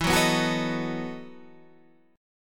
E Major 7th Suspended 2nd Suspended 4th, Guitar, EM7sus2sus4 chord; strummed